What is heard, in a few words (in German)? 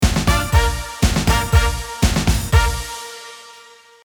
Tusch 3x; Narrathuerium